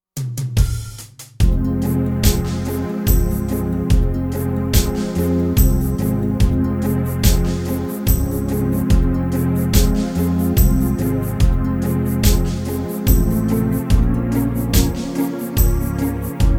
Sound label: brass minus